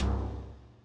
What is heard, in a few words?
Switch to Grenade Eggs; Banjo-Tooie